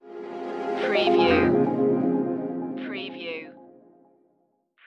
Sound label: stinger, hi-tech music